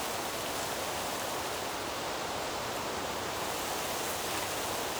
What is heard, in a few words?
wind grass